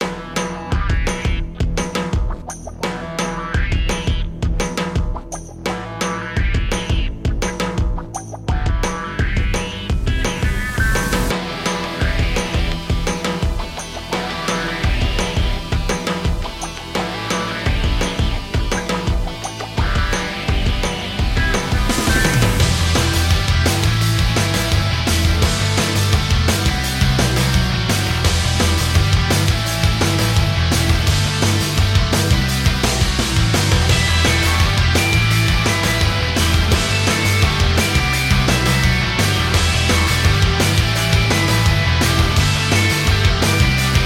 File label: thunder battle; spirit beast